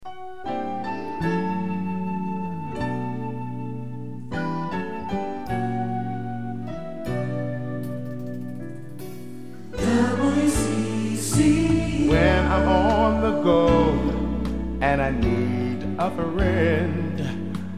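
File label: Soft Full Vocal Jingle; WCCO AM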